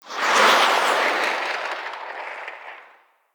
Siege Proj Whoosh; Level Events; Midrath; Warsong Prologue

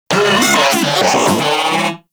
Moa C Vocal Ability; Warframe

